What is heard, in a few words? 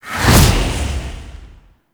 Sonic Dissipation Cast; Warframe; Focus; Vazarin